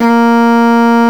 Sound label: Arco Cello A#1